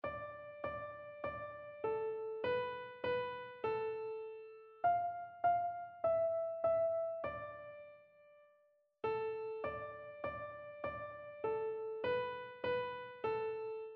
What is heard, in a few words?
mode identification